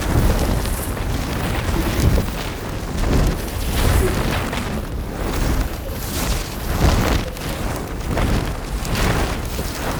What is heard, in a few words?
Fire Loop; Daklo; Warframe; Tau; Betrayer